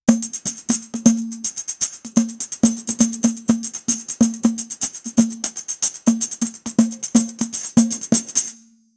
ritmo muy alegre de percu, celebration

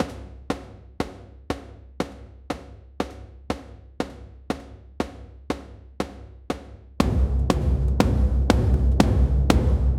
golpes secos de tambor

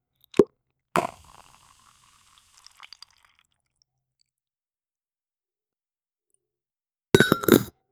sonido de una botella; beverage

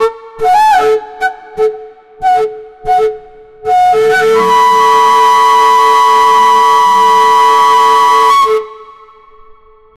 flauta sola el momento

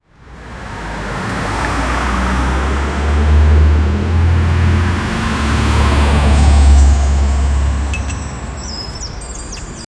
sonido ambiente de la cal, town

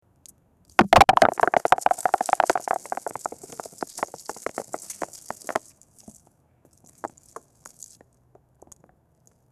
sonido de rocas cayendo; rock

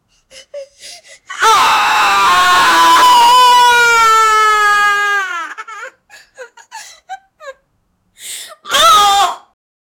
grito de dolor

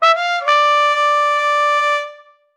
trompeta imperial

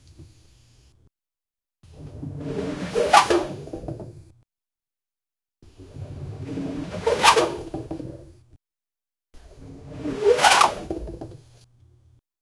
continuo deslizamiento po, park

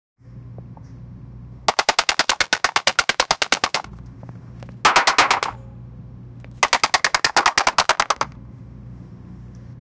muchas flechas golpeando; arrows

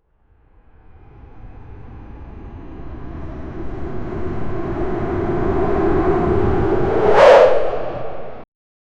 torre tierra proyectil en, projectile

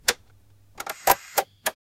efecto de sonido de cambi, television

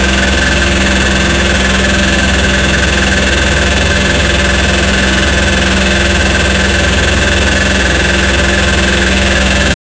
el rugir de un motor, engine

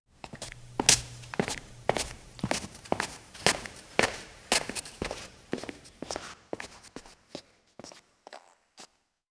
necesito una escena sonor, walking away

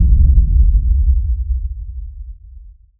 explosin nuclear distante

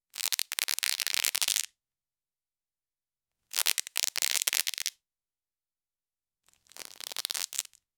cuerda rompiendose por la, rupture

